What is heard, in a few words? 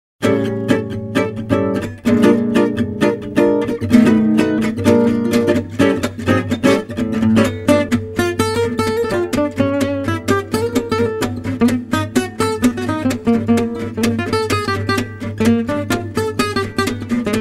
Manouche; MyVirtualBand